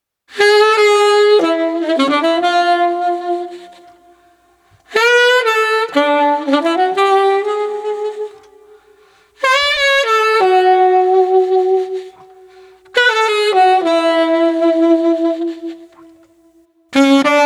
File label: Tenor Sax Introspective 105